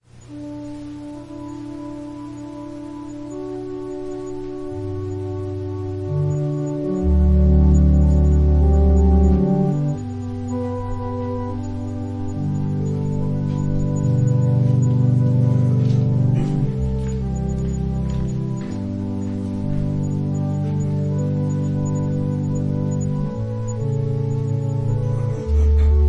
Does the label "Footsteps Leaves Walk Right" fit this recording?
No